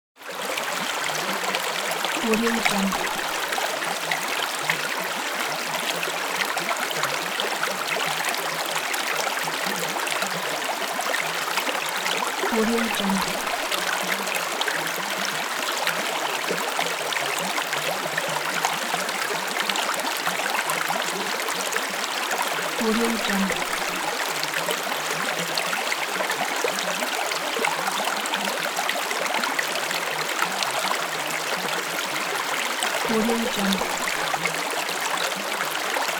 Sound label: water stream running